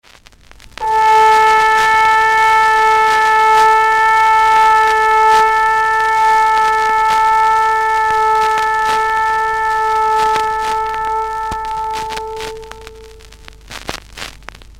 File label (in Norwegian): lang tone